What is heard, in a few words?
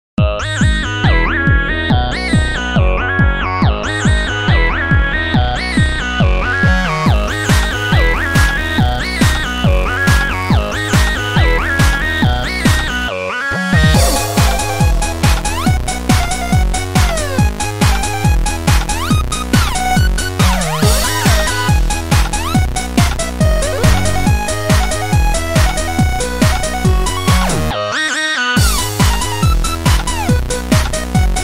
Tetris remix; NewTechno